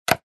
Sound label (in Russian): Звук включения света на кухне